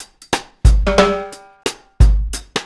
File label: RG90 DRUM, REGGAE